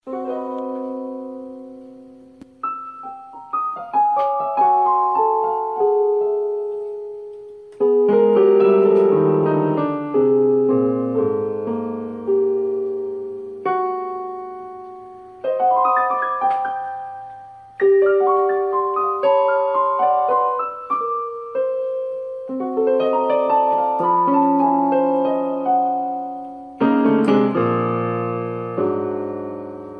Piano improvisation

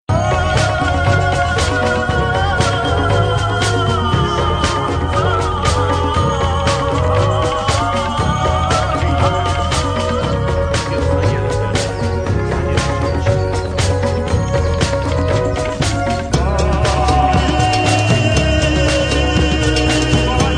Loop; Guru

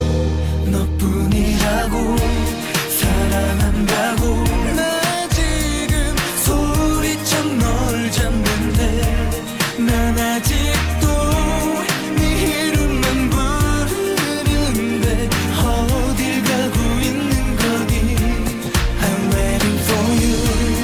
Romance

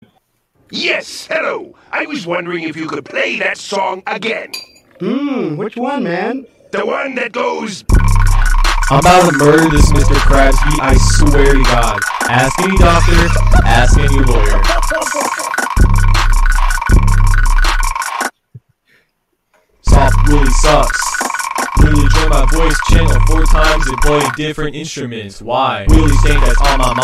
Instrumental

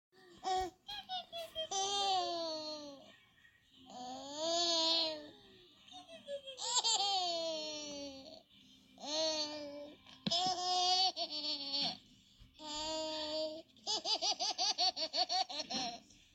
Baby Giggle

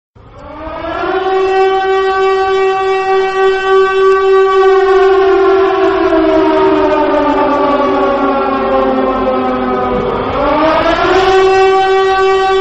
Air Raid Siren

